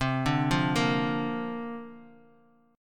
C 7th Suspended 2nd Suspended 4th C down Bass Standard; C7sus2sus4 arpeggiated